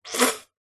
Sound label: human spit; zapsplat